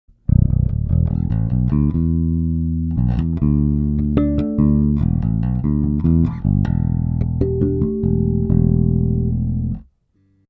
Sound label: HB Block 800b Jbass Fingerstyle Lowmid Boost